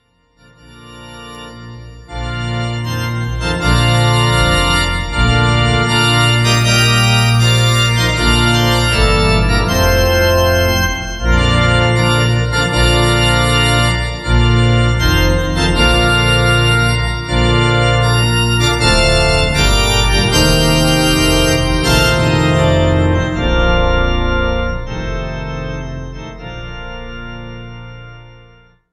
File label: Here Comes The Bride organ